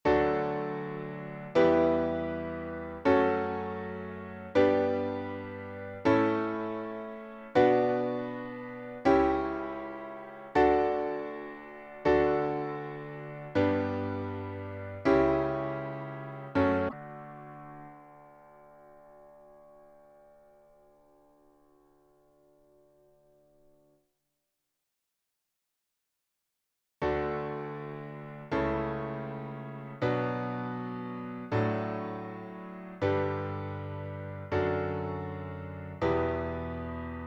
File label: Full Diatonic Major - D2